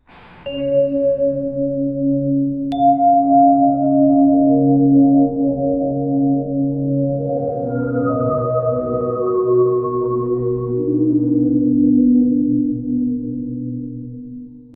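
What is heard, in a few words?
soothing melody of underground, caves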